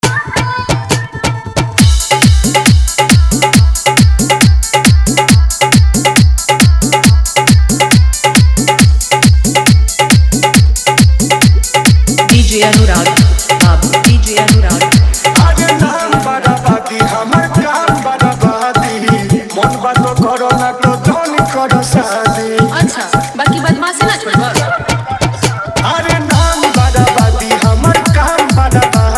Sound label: Hard Bass Mix